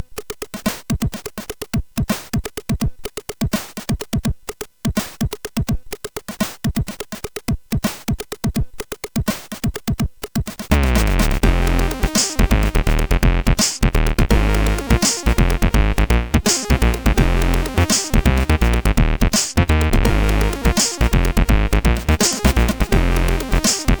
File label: Notejingle, CSG8580R5